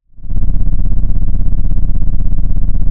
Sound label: Sound of an engine revving